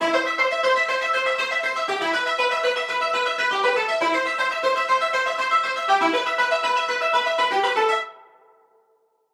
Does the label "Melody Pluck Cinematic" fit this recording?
Yes